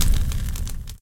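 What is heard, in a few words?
attack fireball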